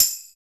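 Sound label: PER PROCU, Procussion, percussion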